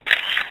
camera shutter; Samsung SCH-A915

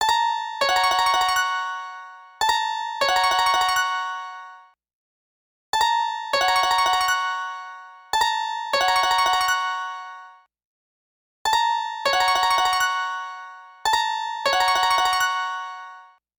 Simple Beep

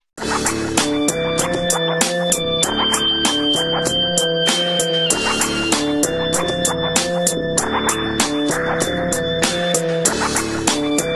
Hip hop; Sanyo; MVP

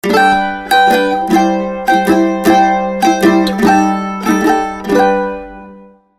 Mandolin Morning; Sony Ericsson; T650; PlusFourSix; Sania; Lars Edvall

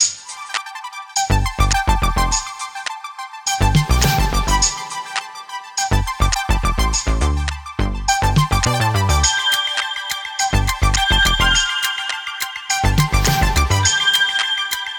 Synthwave; Google; Pixel-4; Retro Riffs

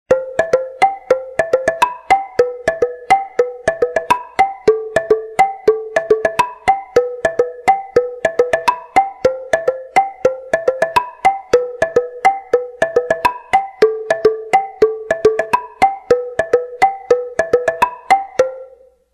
ring, soda; HTC S740

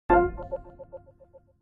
sms nudge; Microsoft Windows-Phone-7

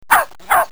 DOG; LIVEPAPER; Spreadtrum

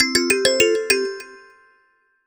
Swing; China Telecom; alarms

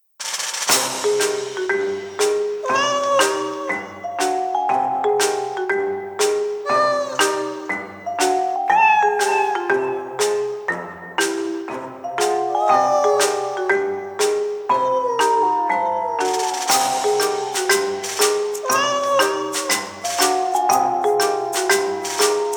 Meow, ringtones; Samsung; Galaxy A SHW-M100S

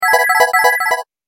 Serene tone

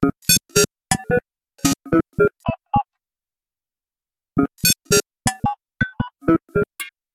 beetle, ringtones; Nothing Phone (1)

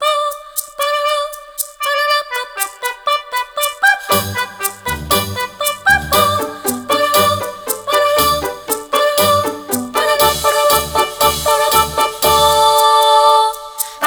Strange Trumpet, alarms; LG G2

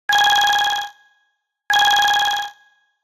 ring, standard; HTC S740

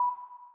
Lock; Xiaomi; Redmi 2

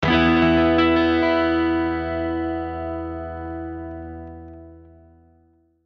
Just notes

